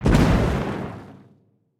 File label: damage hits explosions, Warships